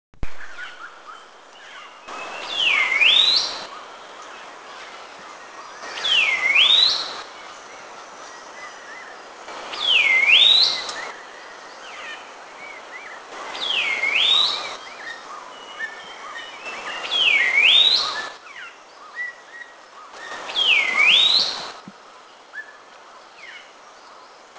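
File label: Blue-winged Laughingthrush call; India; Eaglenest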